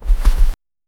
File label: soft leather steps; oriental